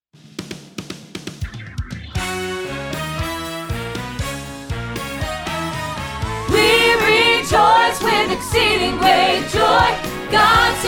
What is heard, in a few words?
soprano rehearsal track